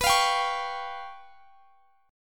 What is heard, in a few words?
C sharp 7th Flat 5th B